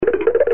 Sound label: Cartoon Run Feet